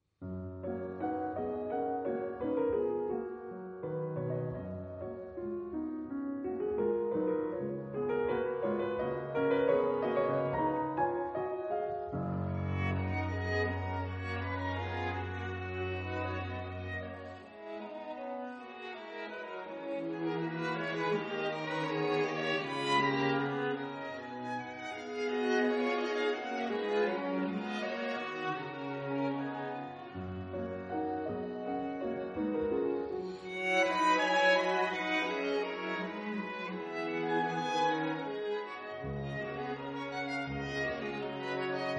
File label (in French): Quintette